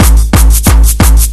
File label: 180 clap